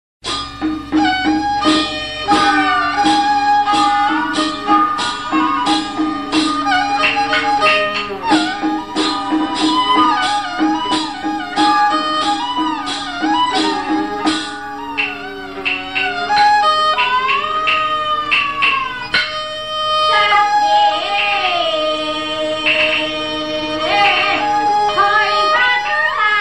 traditional taiwanese

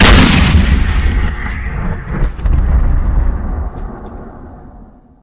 flak cannon; ringtone

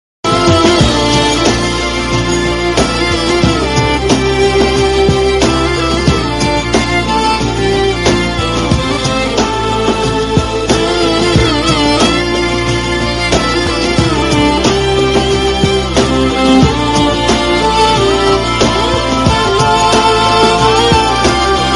awesome guitar, ringtone

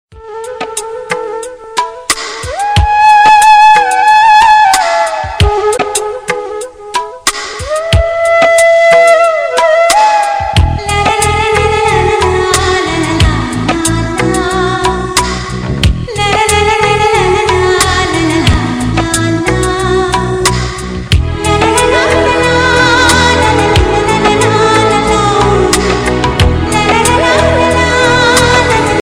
bansuri, ringtone

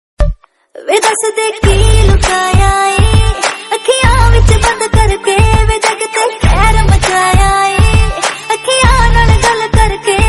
punjabi ringtone

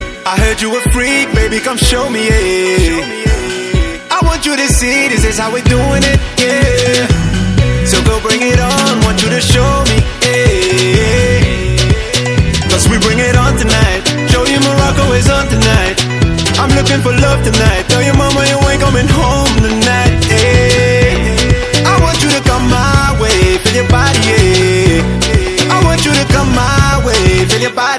morocco nice

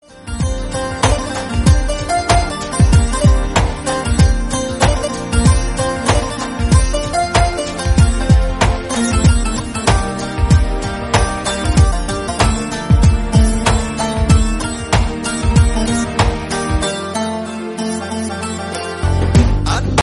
guitar music ringtone

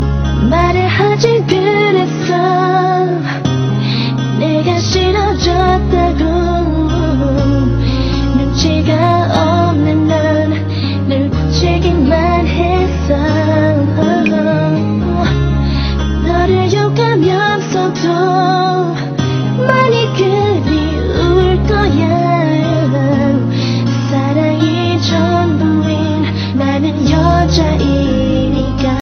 chinese romantic ringtone

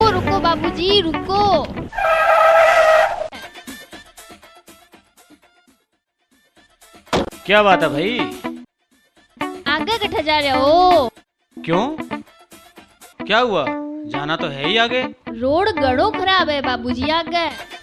pop ringtone

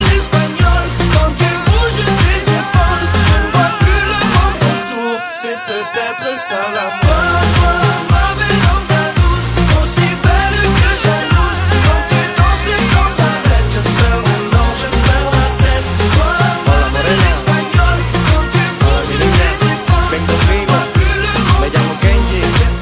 french ringtone

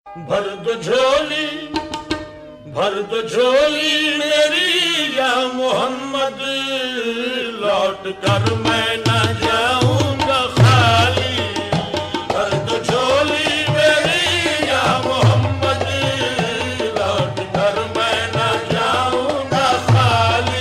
best qawali ringtone

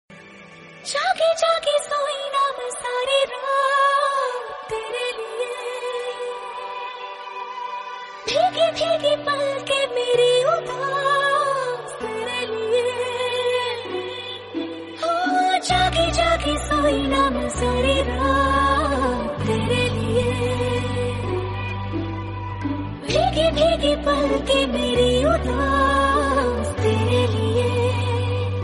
female

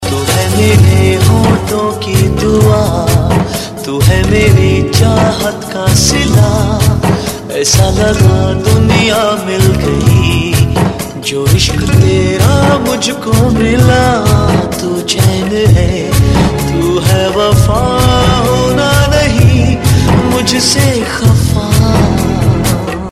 sad ringtone

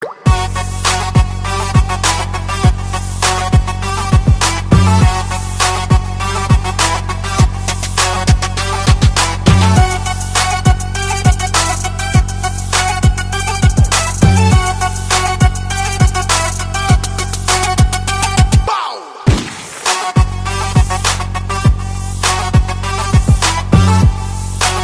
qatar trap

